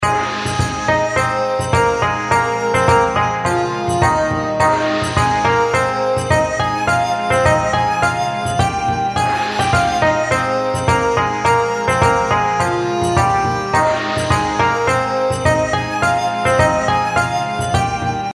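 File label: outro background music, white snake legend; Legend Of The White Snake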